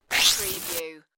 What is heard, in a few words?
Scifi Production Element - Swipe Feed